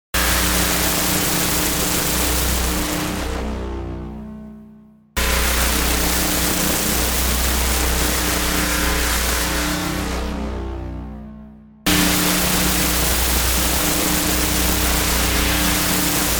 Harsh metallic Industrial grinding to make your teeth rattle; Sound Design